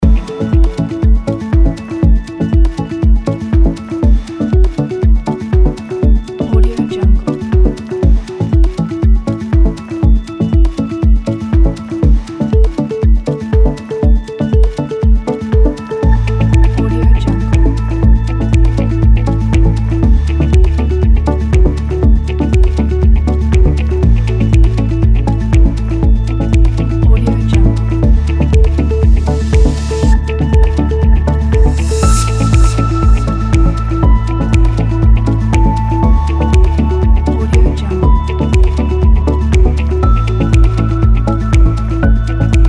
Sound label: corporate loop happy background